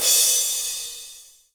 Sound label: soft hit finish